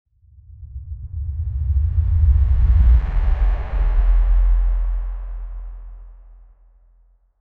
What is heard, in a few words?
descent whoosh long